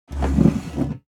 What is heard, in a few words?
chair rub